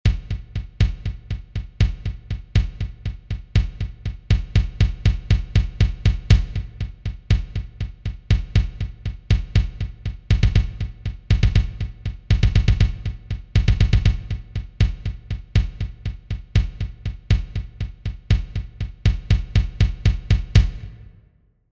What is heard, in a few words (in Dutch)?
timbel, Trommelkorps; Chirojongens Wij Koersel